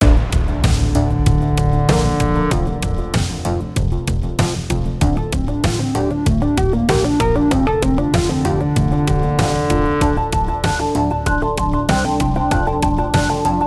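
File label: Music Electronic, Electronic Loading 96bpm; Music Elec 1#1 (Pm Elec Loading 1 96bpm Game Mix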